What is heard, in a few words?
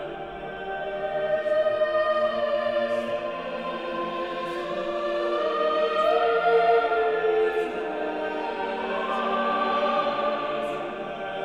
chorus